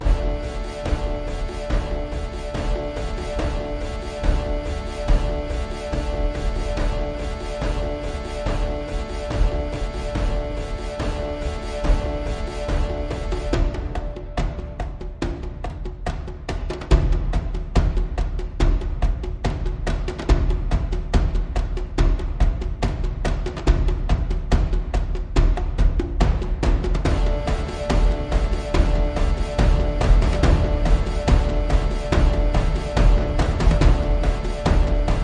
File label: Perc and Pulses Only